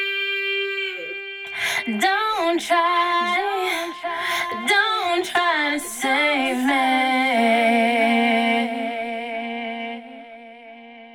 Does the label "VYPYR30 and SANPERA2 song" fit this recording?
No